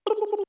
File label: Keytone; LG GD550 Pure